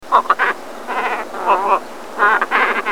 kawau

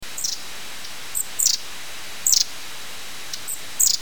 simaenaga

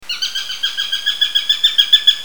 haitaka

